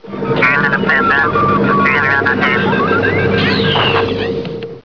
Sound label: probe transmit